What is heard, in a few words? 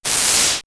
Acid Burn, synthfx; X-Static Goldmine